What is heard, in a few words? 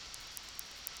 Background Noise